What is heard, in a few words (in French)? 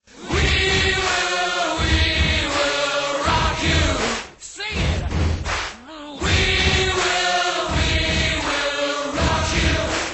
chanson water